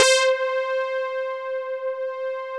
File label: TS 10, Analog, Synthesizers; Soli-Music; World of Synthesizers VOL-1